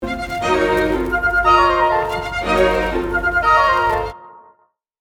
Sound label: Overture Antiphony, The idea repeats antiphonally with the winds; Rule of Three; The Nutcracker; Sustained chords in lower strings